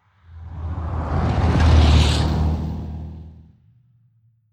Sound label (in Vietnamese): Tieng xe o to chay